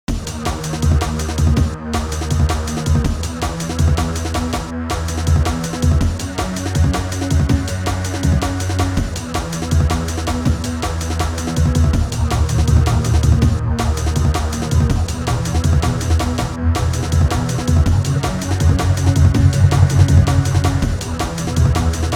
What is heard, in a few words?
drums; lolicore